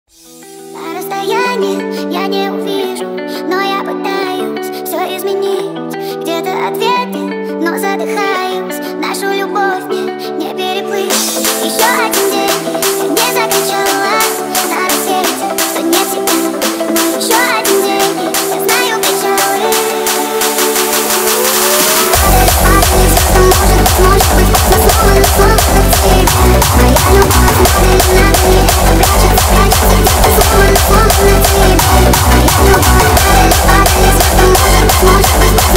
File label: hardstyle remix